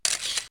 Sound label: Shutter; KB770